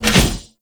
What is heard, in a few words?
Impale Cast; Warframe; Hoplite